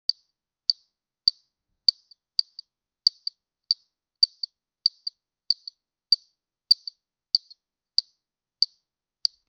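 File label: when cricket bat hits ball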